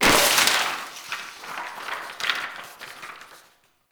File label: Desktop Book Destroy; Warframe; Entrati; Ambience